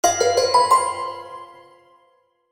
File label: stormy; HTC; EVO-4G-LTE